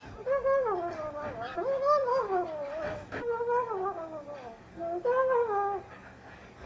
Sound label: Sings, eclectus parrot; Baxter